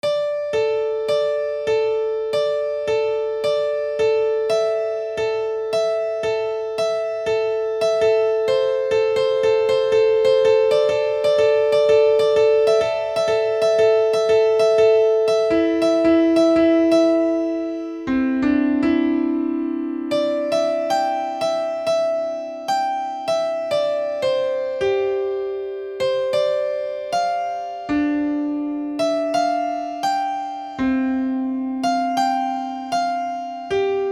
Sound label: piano